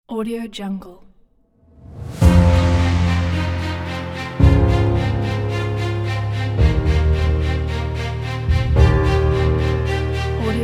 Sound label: dramatic logo